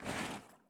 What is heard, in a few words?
prone asphalt; Escape from Tarkov